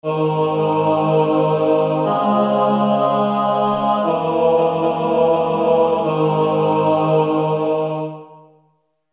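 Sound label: Baritone, barbershop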